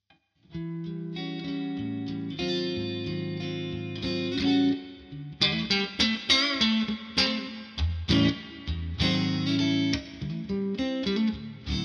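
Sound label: clean neck, guitar pickups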